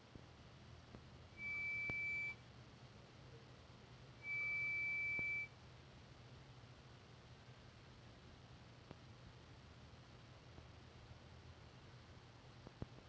engine compartment noise glc300 ping beep whistling sound recording; glc-class x253